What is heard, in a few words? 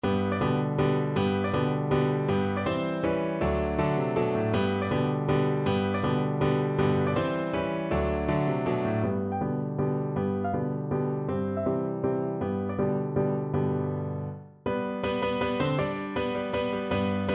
vivaldi autumn, piano duet